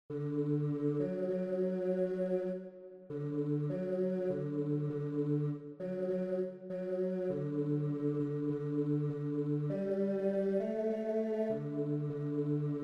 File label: Separate voices Baritone